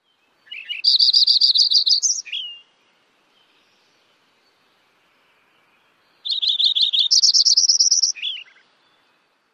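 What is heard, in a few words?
songs, bird; CA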